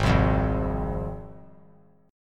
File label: G sharp 6th G sharp down, chord; strummed; JGuitar